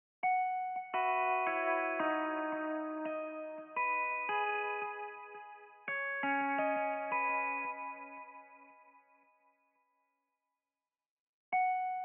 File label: 85 BPM C# Min Guitar; Melody; RnB; Loop Stems; Cymatics; 2022 Melody Collection - RnB Pt 1; Distance